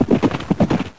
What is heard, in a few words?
horse mov; Caesar III